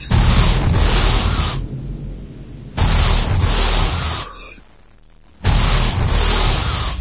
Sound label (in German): maschine; svencoop; tower6